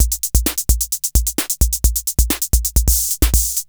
KR33 DISCO; Crypton - DrumBase5000

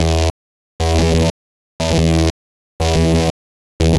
Guitar Loop, 120BPM

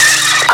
SCREECH, FAIRLIGHT CMI IIX

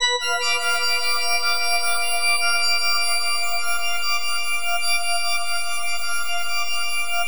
FM B DIM; Bolder Sounds

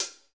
CYM 14, Real HiHats, 14 Hi Hat

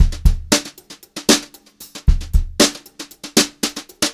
HOTROD BEAT, Brushed Drum Loops; USB Soundscan vol.39; AKAI

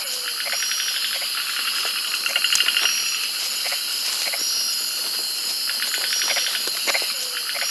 ATM SWAMP, Swamp Atmosp; Roland - LA Composer vol. 2

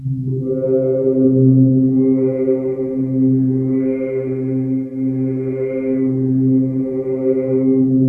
wake up pad; WAKE UP LYR; Masterbits - Tekkno Trax; SYNTHFX+PADS

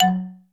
OCTARIMBA, TONAL PERCS; Crypton - DrumBase5000

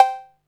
PERC, Techno 138 BPM

